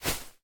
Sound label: Footstep Beanbag